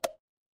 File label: cartoon bonk